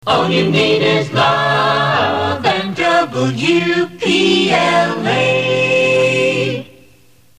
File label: jingle; WPLA